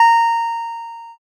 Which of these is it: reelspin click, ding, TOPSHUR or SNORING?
ding